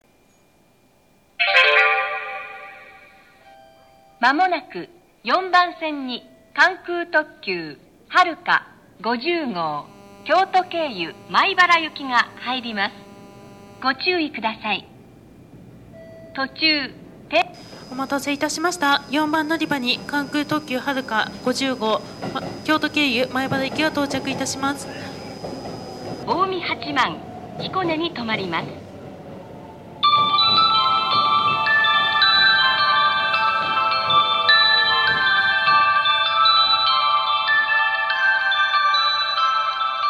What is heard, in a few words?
kansai airport track 4; nishinihon; haruka 50go